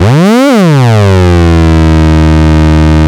MEMORYMOOG Eb2; Big Fish Audio - First Call Vintage Keyboards

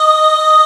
Aahs D#4; Proteus 2000; Digital Sound Factory Proteus 2000

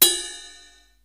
BELL, Boss DR-550; Drums

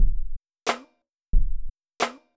junkyard rhythms, drums

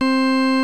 60's Organ

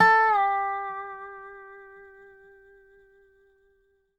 dobro; DOBRO DOWN; Country

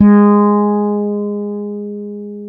FRETLESS G#3, BASS; Northstar - New Gold VOL-2

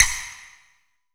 FX BELL

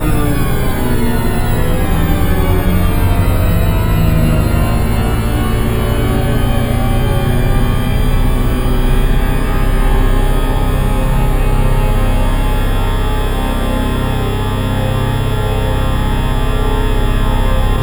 landing, ship drones; Zero-G Ambient 1

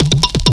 Tribal Backbone Up; Mo' Phatt; Digital Sound Factory Mo' Phatt

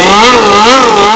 RACE CAR; M8; CMI Factory

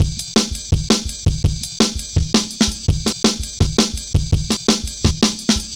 Jazz Ride A, Drum Loops, 166.5; Zero-G Jungle Warfare 1, 2 & 3; 90 s Sample CDs

